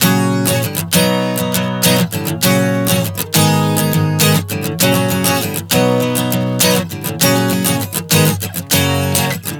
F#-B-F#-G#m 100 bpm, acoustic guitar chord progressions